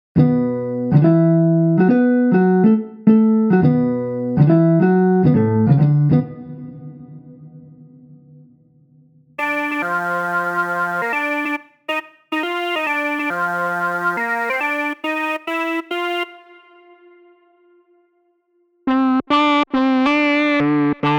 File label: Leads Jazz; Melody Demo; Unison MIDI Wizard Plug-In